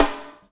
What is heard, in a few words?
FUNK SNR; Spectrasonics - Burning Grooves CD2